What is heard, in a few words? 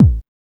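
bass drum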